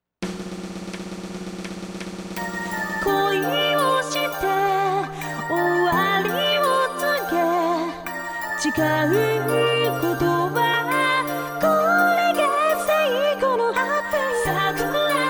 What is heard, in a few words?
karaoke tempo